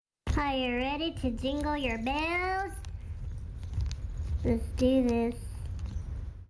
jingle bells fail

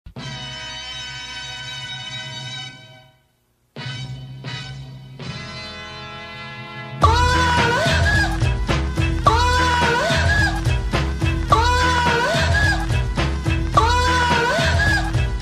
ringtone

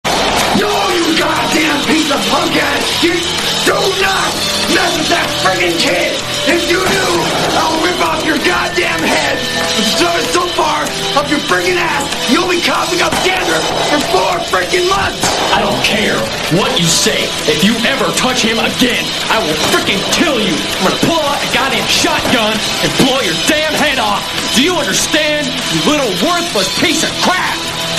ringtone

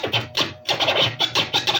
record scratch sup

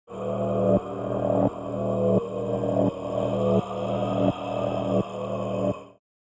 mantra

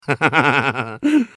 laugh and gasp

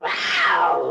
rawr

